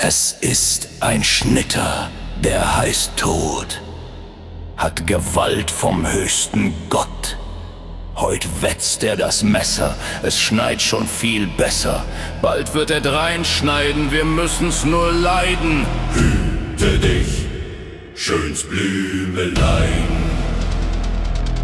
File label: Techno; Schnitterlied